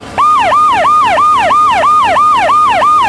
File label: siren, Firehouse; kids-tetris